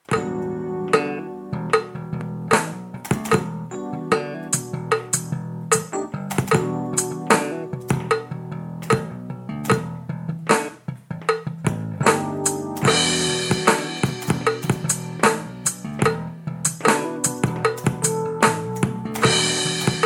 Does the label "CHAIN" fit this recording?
No